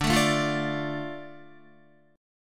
D Minor D Guitar; Dm strummed; 9,12,9,10,9,10; JGuitar

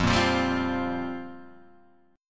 F Suspended 2nd Suspended 4th F down

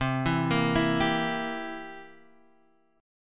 C 7th C down Guitar Open F, C7 chord; arpeggiated; JGuitar

